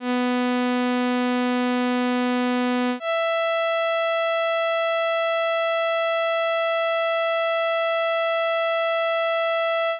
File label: micro guitar